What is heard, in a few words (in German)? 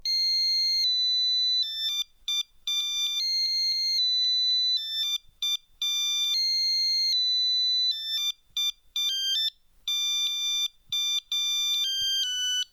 Eieruhr zum Mitkochen; Rock’n’Roll PiepEi